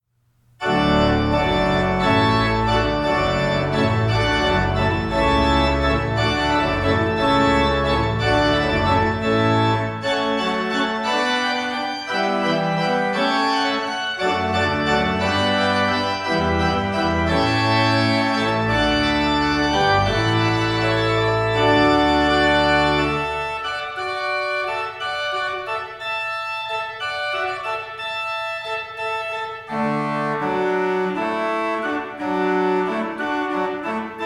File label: Water Music Hornpipe